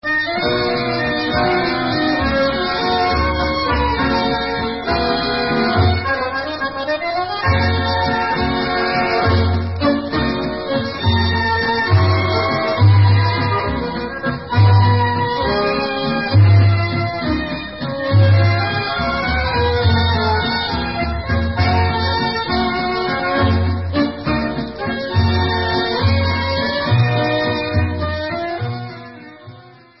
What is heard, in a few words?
Vals